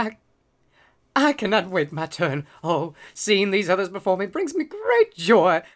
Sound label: Male Reaction